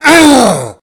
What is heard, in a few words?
Scream